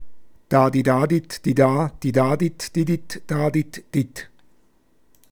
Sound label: CW Da dit, Morsen; amateurfunk